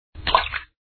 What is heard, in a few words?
splash small; Raft Wars